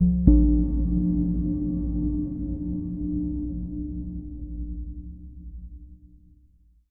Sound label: editor blips; Source Engine; Portal 2